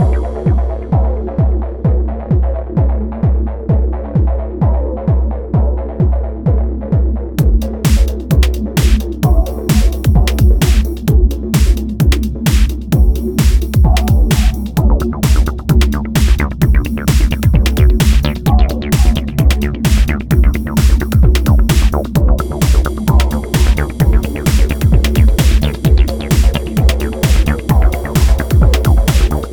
dance loop

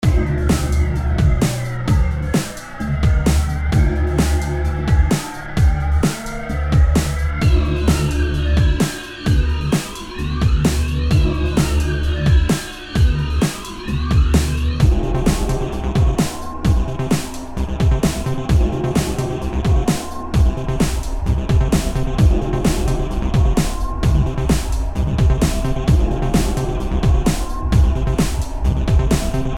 bladespin loop

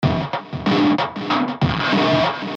distrotrash loop

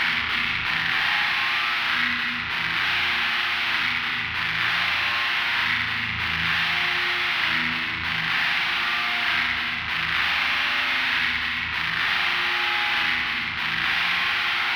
trance loop

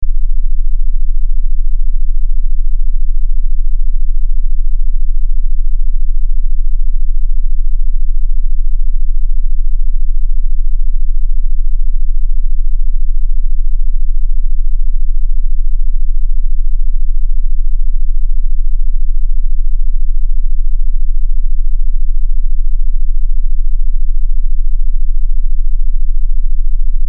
binaurals lower beta

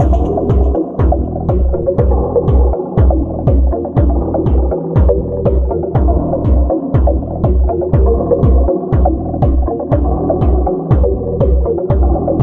big trance loop

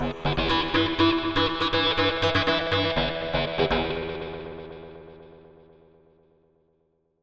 electro loop

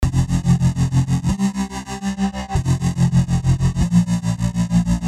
loop tremore

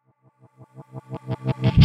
woofa loop

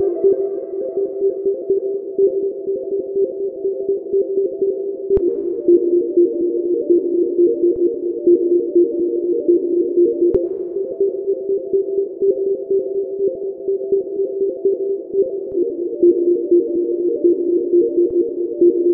Loop

